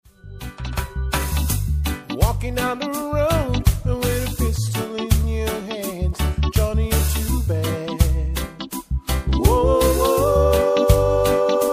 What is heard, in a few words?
reggae, steeldrumband